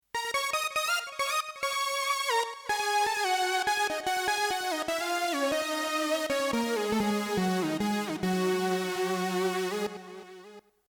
Leadsynth

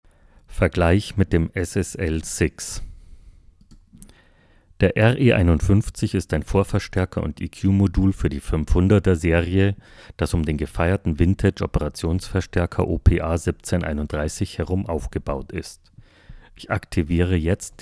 Lindell RE 51 SSL SiX Shure

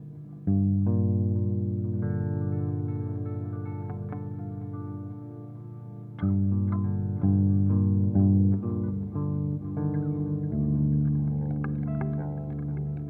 Timeline Bucket; Strymon

